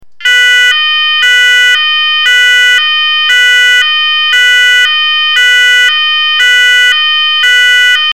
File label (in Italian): EVACUAZIONE 610HZ